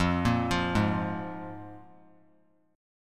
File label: F Minor Double Flat 5th; Fmbb5 arpeggiated; JGuitar